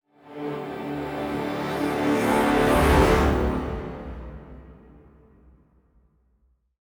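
Music Orchestral, Orchestral Transition Loading start; Forza Motorsport 6 Apex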